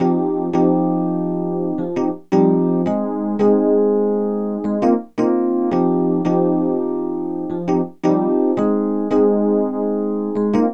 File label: Drum & Bass; Best Service ProSamples vol.03 - Drum & Bass 1; AKAI